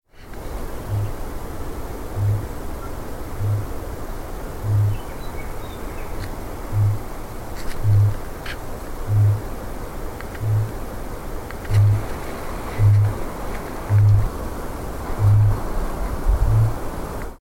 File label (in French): Kakapo boum